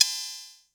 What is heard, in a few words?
HH Open, Vermona DRM1-MK2 Hi Hat